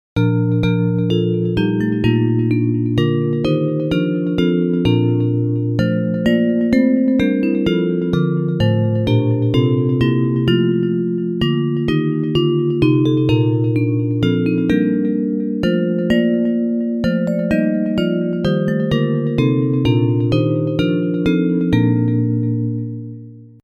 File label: Bells; Hymns